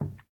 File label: Wood soft